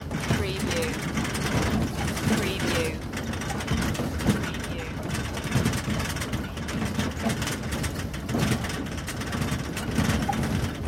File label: atv bed ride